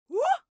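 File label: female attack wuh, screams; schreie; menschen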